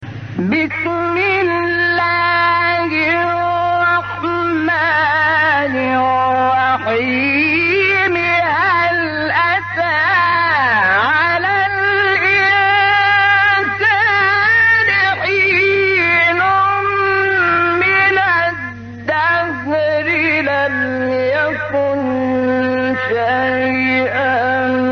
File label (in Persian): فراز زیبای ابتدای سوره انسان; شحات محمد انور